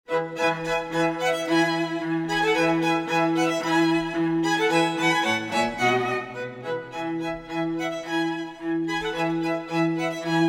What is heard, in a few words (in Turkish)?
santral fon muzikleri